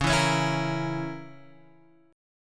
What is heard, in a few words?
Db Minor 6th Db down Guitar Open G